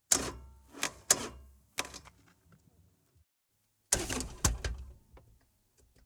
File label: HD Sportster Iron 883, False start, L-Exhaust, R-Motor, Harley Davidson; Borg Sound; False start 2 and 3